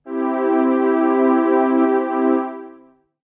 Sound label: heaven strings; lmms